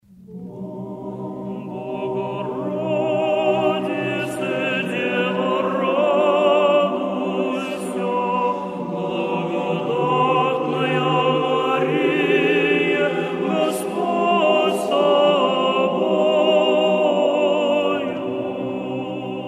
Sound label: monk choir